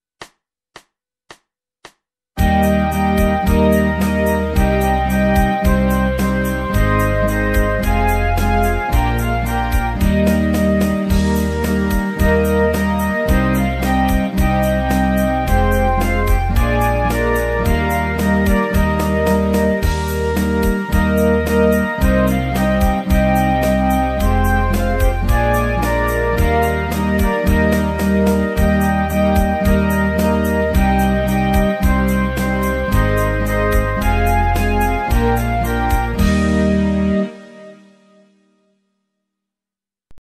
Flöte Litauisches Volkslied; Schulband Musizierklassen; drumset

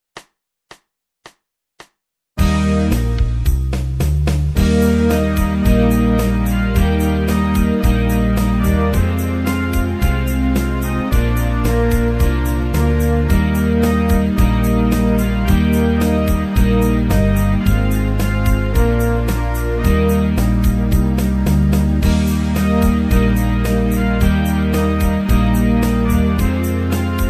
The Muffing Man Kinderlied aus England, fagottino in f; Schulband Musizierklassen

